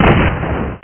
ENEMY X; t2029

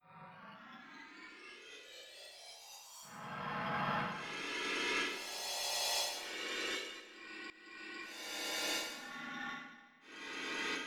Reverse Cymbal; FF7

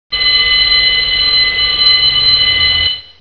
bell snd

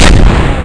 arm Locker; Big bang

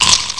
Munching; Apeiron

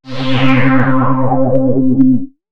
Flange, Synth

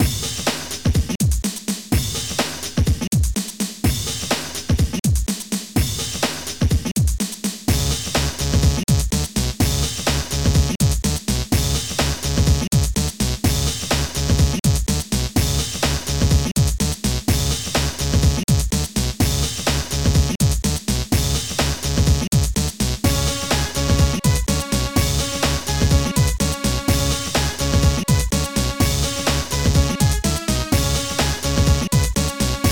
frog

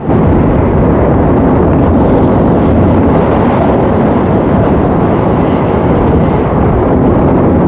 light wind; PC Gamer IT CD 41; Daikatana

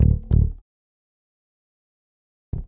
bass loop, Rock; ACID MUSIC

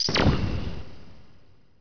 pulse rif; PC Gamer IT CD 41

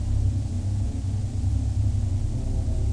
Hum Loop; MacAddict

